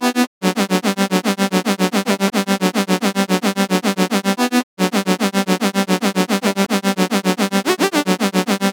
drop loop BPM 110 E Min; Gully Loops; Bishal; UDD Gaye Sample Pack

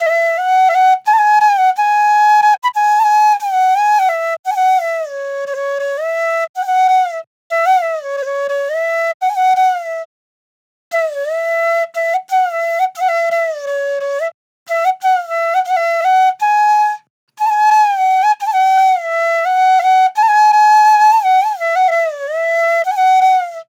Krisna Flute Loop 88 BPM A

